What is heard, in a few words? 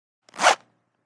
Pants Zip Unzip; zippers